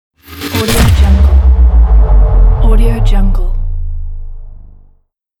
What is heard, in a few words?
cinematic transforming opener impact